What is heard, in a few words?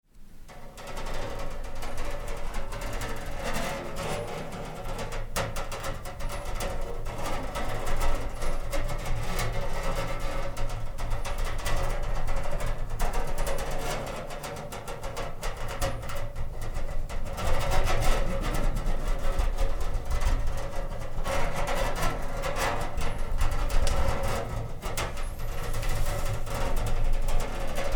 Metal Grinding Long; Long, continuous sound of stretching metal, metal bars or doors being exposed to force, like bending or twisting; Metal Sound Effects; LynneMusic